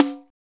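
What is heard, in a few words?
pandeiro; Roland LA; ETHNIC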